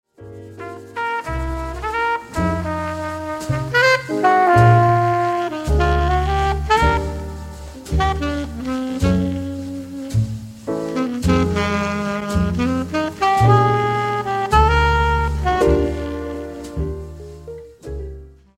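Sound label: solo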